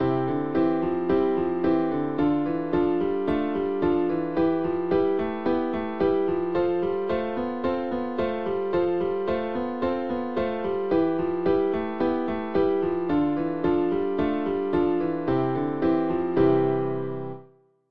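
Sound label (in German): Fingerkraft Übung, klavier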